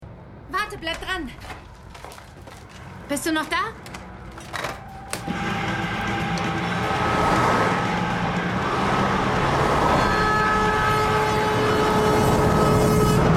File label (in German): Verkehrsaufkommen